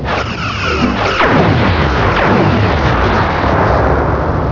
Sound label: phasers; hofclient